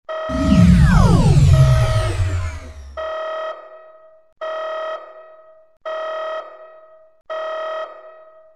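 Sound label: klaxon; ebridge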